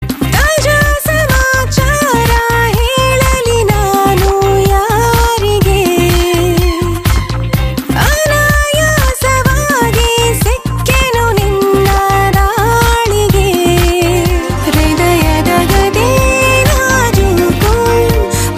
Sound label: FeMale Melody Ringtone